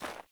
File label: Sandals Run Snow, Footsteps